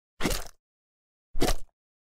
attack

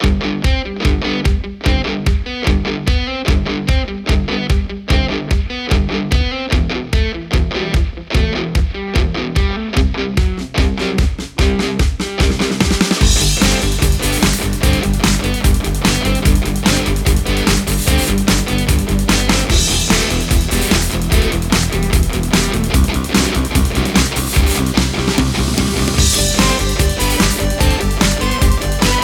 corporate rock

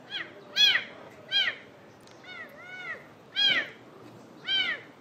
serval

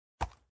soft

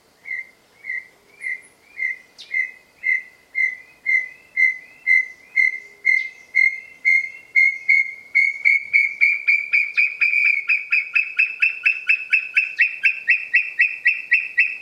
brown tinamou

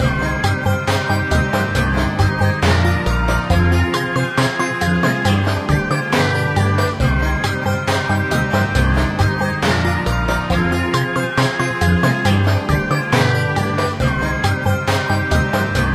lose screen loop

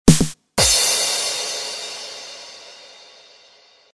ba dum tss

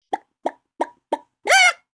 chicken

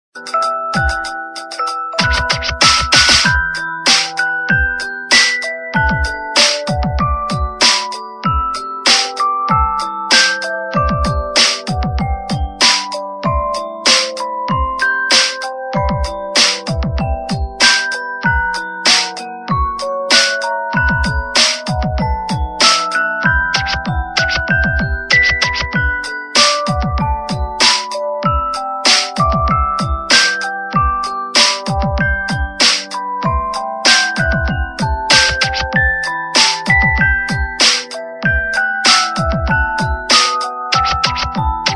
ring, g-tide; звуки для телефона G-Tide

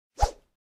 badminton

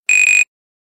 nokia 6120 buzzer

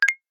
camera start video; Рингтоны, звуки будильника, уведомлений и системные сигналы смартфона Alcatel

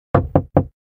galaxy watch knock

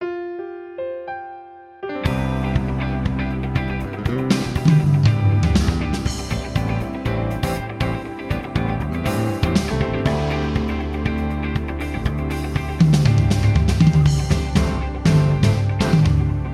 Ecliptic, Samsung TouchWiz

